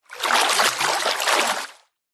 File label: nature water movement surface splash light hand; zapsplat